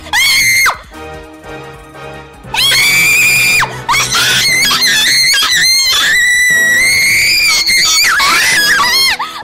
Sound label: screaming; akai haato; haachama